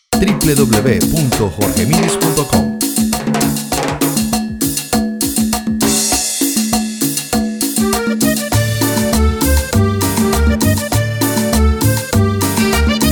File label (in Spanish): Cumbia